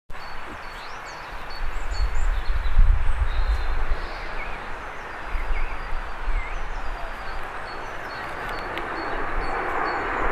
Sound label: one flight per minute overhead; Temple of Aeolus at Kew